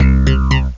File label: mirage bass; OctaMED SoundStudio V1